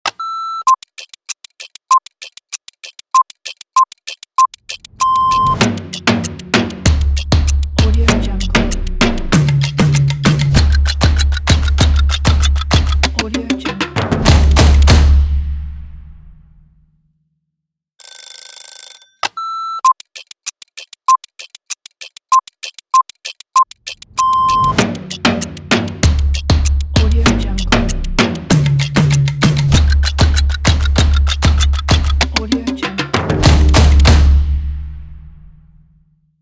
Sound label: ticking clock stomp logo; redoctopus